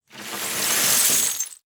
Crash Glass And Wood Debris Sliding And Tumbling On Concrete; Crashes